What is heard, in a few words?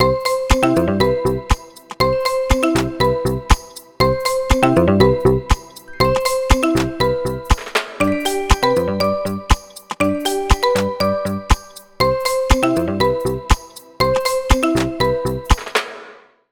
Vibrant Moment, ringtones; Honor; Magic-8-Pro